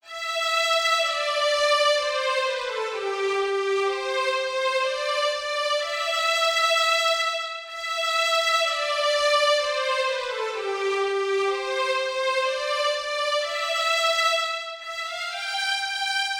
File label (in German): Es, es, es und es C-Dur 126bpm, Volkslieder